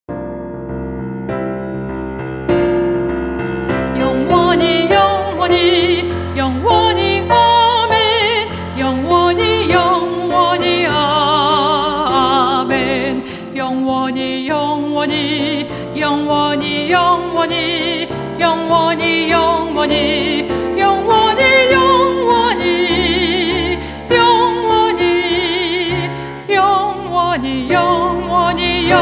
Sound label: ALTO